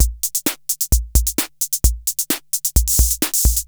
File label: KR33 ROCK; Crypton - DrumBase5000